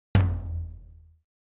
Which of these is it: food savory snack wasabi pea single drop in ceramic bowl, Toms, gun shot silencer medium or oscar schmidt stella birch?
Toms